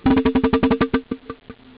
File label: DRUMS